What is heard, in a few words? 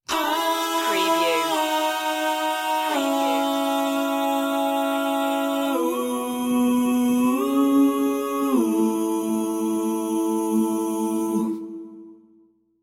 young and carefree stinger